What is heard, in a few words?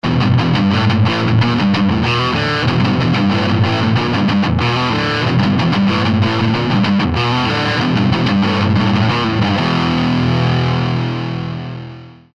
marshall max g rhythm, guitar